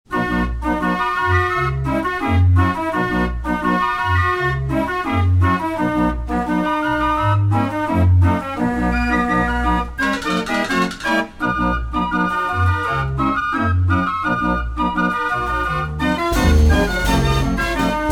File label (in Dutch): draaiorgel, pansfluiter; de pansfluiter